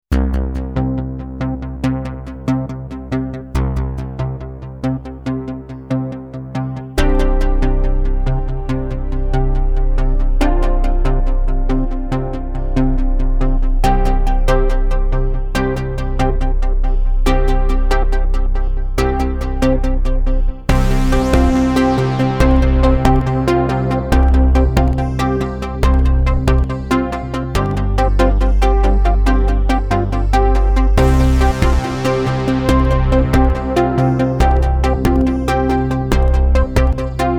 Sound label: minimax song; Creamware minimax